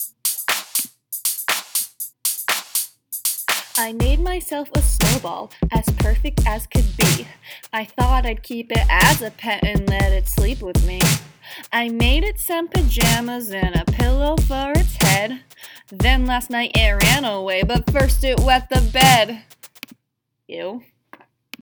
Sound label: snow ball with words